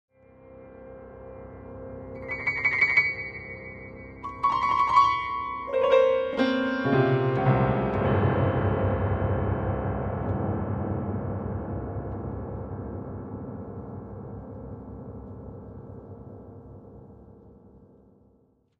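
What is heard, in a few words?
Grand Piano Long Tension; keyboards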